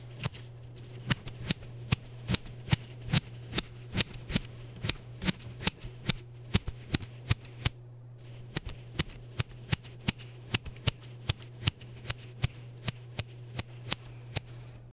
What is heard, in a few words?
tailor cutting clothes; crafting